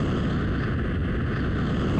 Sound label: jet light; space kitty